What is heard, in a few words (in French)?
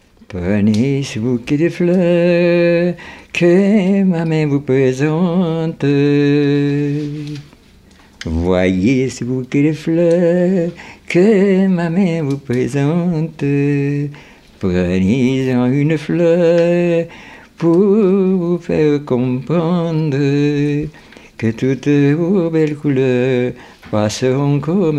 La chanson de la mariee